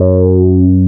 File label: talk F#2; PlatinumPhattCD1; E-MU Producer Series Vol. 8 – Platinum Phatt; Best of Phatt